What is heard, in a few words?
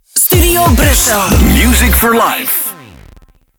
Key Sweep C 91bpm; StuBru; Music For Life